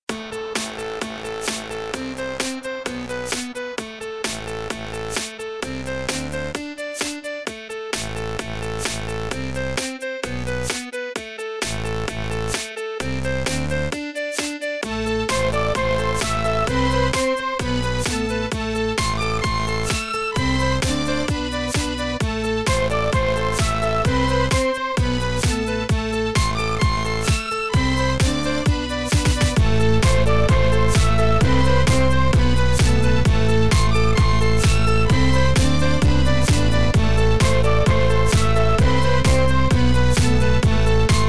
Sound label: Violin; Everfree Radio